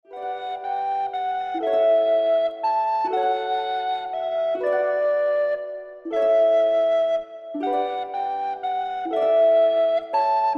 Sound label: Recorder Ensemble